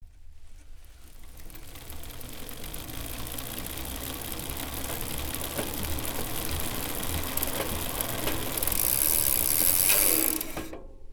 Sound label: bike, anechoic bicycle; OPENAIR